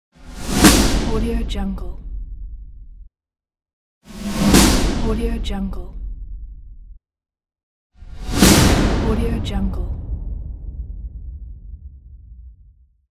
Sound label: military drums